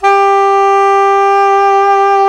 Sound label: SAX SOP SAX, Soprano Long